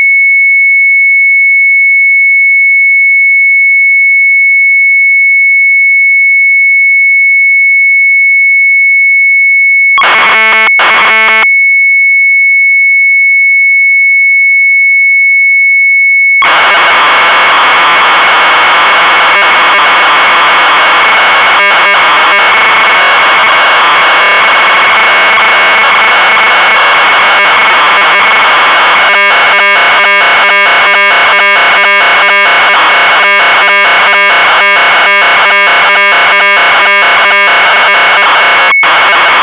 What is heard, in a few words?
Sharp MZ800 Tape